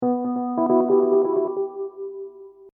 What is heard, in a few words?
Hip Hop Dark Rhodes Licks 90 Bpm Swing E; Keyboard Loops; Lynne Music